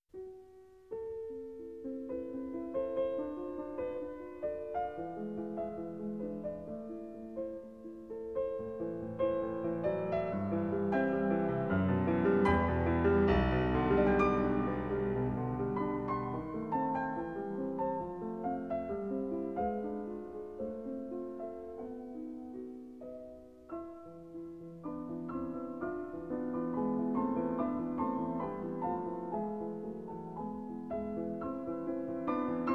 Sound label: Piano Sonata No. 1 in C major first movement; Brahms; Legend of Galactic Heroes; Free Planets Alliance SIDE